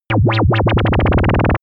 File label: modulated wobble; bewegung; comedy-cartoon; soundpool